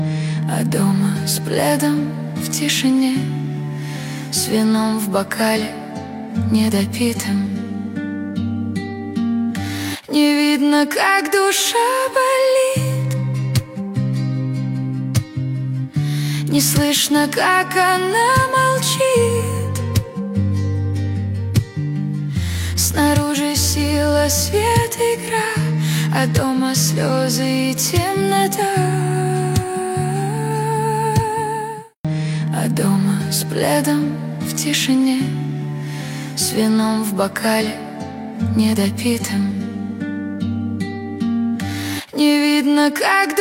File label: guitar version